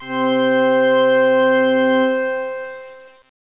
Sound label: cathedral organ, synths; Zoom - Release 2 (1996); Active Software